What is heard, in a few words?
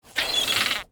BRUTAL DAMAGE